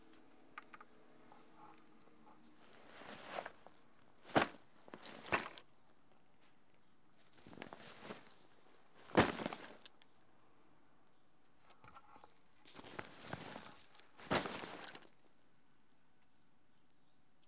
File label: Backpack Dropping